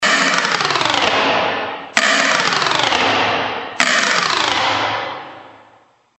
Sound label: bewilderment